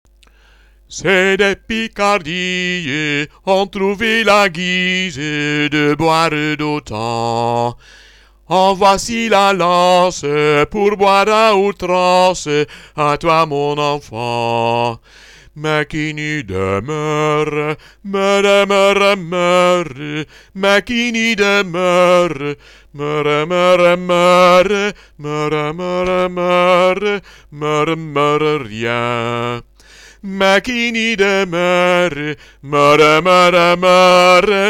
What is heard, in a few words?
Ceux De Picardie Alt